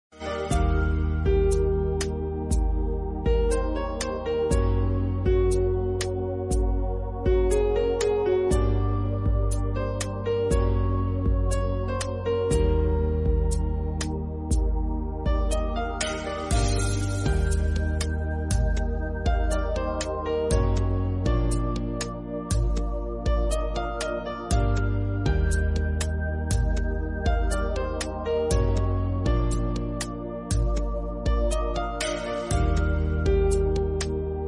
flute ringtone; Kalank

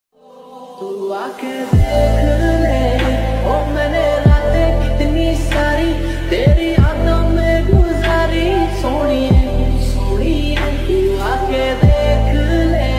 lofi remix

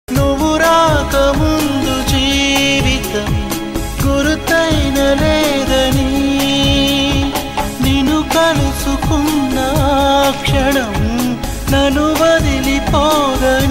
telugu love songs

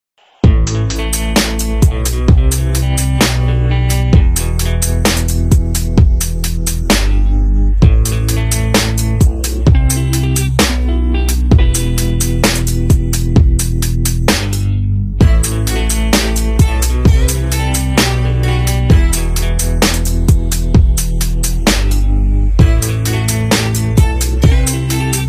instrumental

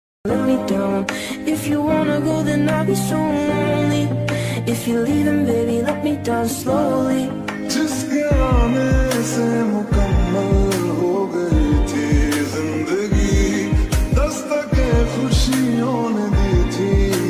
attitude ringtone bgm ringtones english ringtone

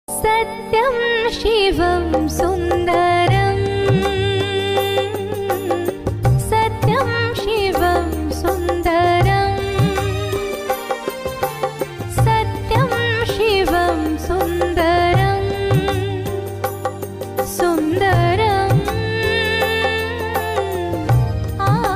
female version ringtone

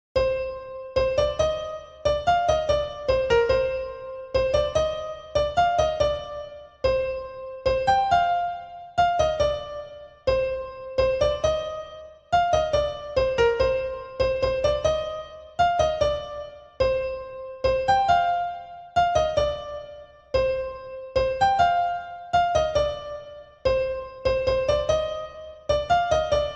bgm easy piano; perfect piano; vijay

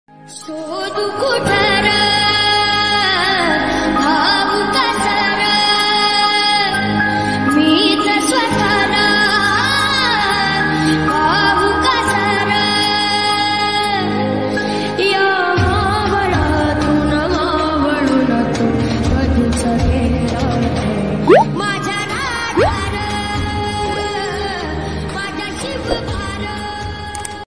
ringtone ringtone; shivaji maharaj ringtone

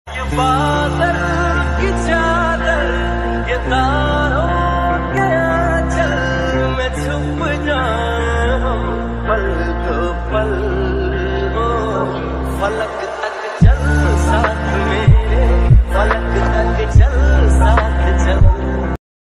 ringtone lofi mix ringtone slowed; instagram viral reels

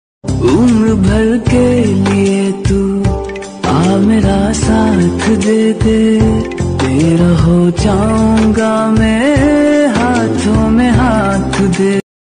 anniversary song

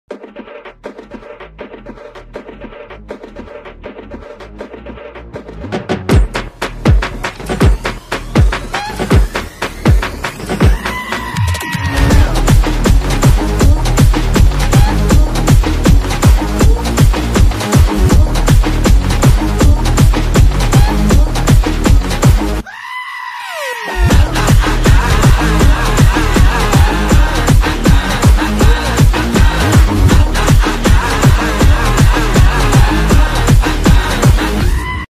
theme original score bgm; tamil

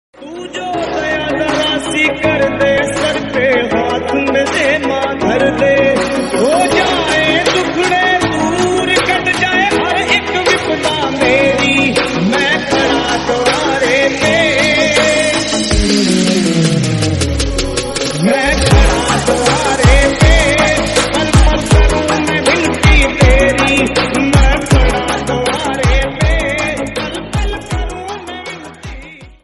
navratri special ringtone bhakti ringtone

